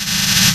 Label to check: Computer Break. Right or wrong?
Wrong